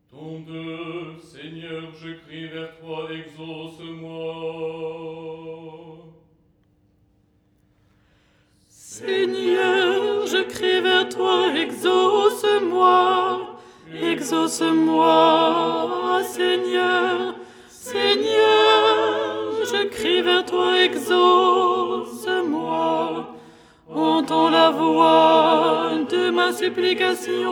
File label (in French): alto